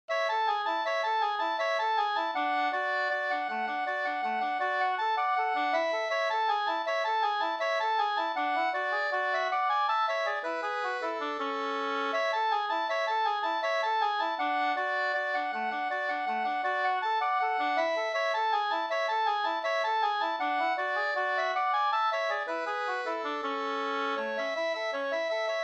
engelska; fr Nattraby

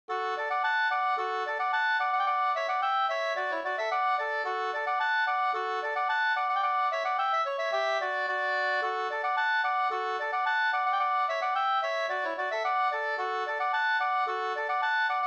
polka, 2st

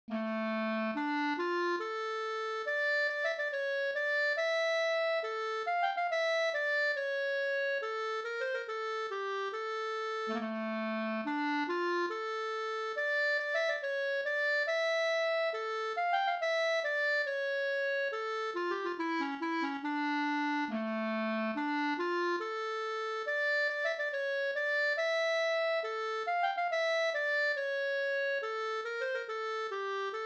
vals brudvals

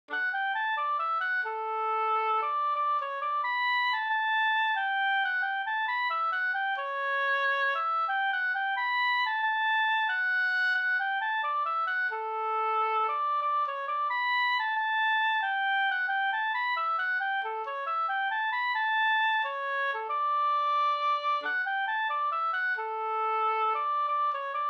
ganglat utstallningsmarsch

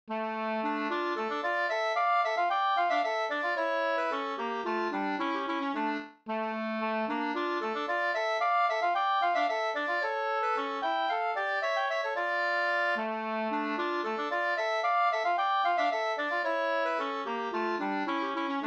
polska brudpolska

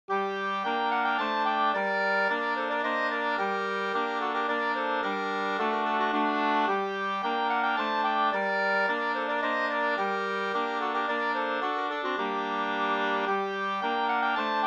polska Bodapolska

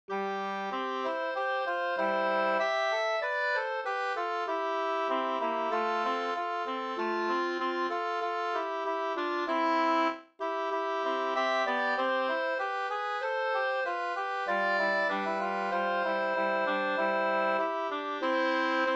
skanklat; Tackvisa fr Sd

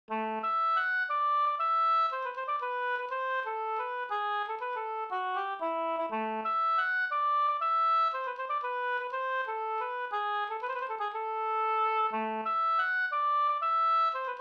polska Brudpolska

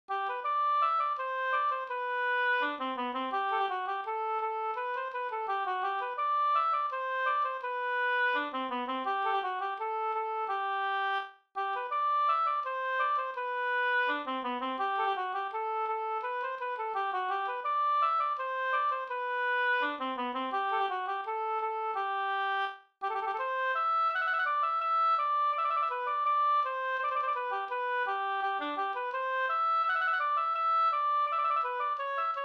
brudmarsch

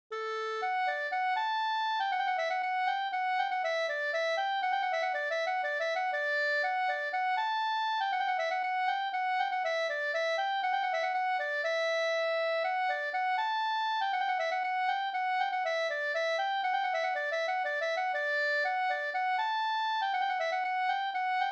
polska Dalbopolska

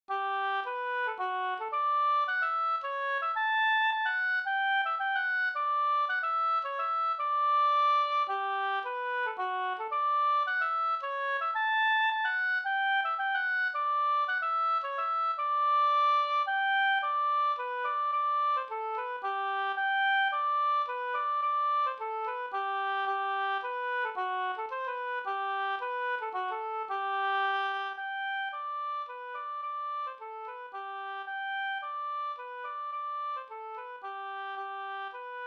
hamburska Gokpolska

